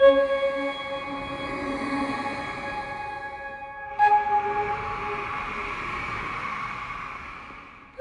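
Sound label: Flute Loop; mushroom men the spore wars; wii; Kudzu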